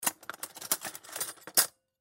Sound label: Silverware Remove, Silverware Remove From Drawer Spoon Knife Fork; Dishware; Household; Blastwave